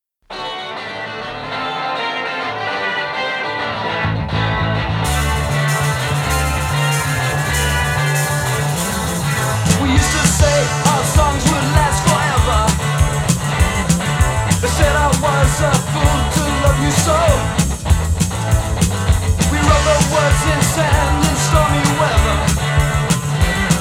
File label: Scratched Blue Vinyl